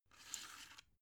drug blister put in soft; arzt

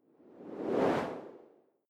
whoosh simple incoming; swooshes-swishes

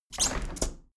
window handle plastic squeak open heavy; objekte; fenster

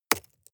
stone rock impact; natur-tiere; steine

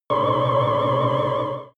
extrinsic siren, energie

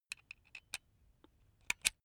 electric drill bit insert; maschinen; bohrer; soundpool

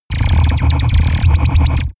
space laser blaster cannon; krieg-waffen; soundpool

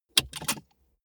car bmw e46 interior safety belt clasp release

